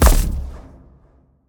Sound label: footstep; smite; npc; grkconqs8 titan chaos; s8chaostitan